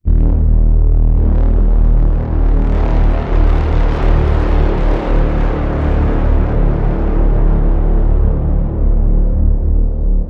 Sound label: Angry Synth Bend